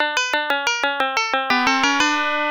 Player Down; Arcade; pepen ga pengo genesis

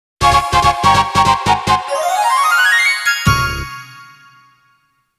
Round Clear

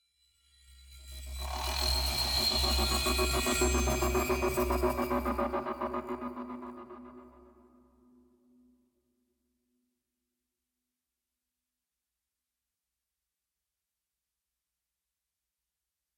Loading up Pads FX; Sniper Ghost Warrior 3 2017

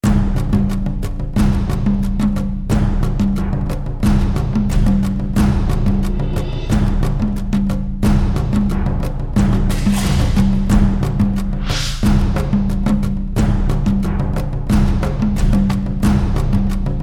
Drums Loop; Townbattle

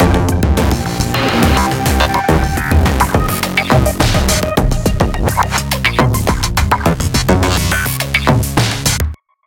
Fight Low Combo; Remember Me

